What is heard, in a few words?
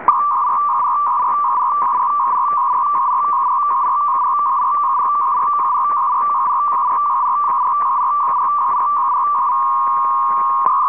ARQ 228.65 Bd; ROHDE & SCHWARZ